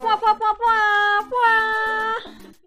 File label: airhorn; mrs sigma